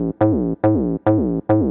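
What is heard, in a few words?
SYNTH BASS DANCE 140 A; eJay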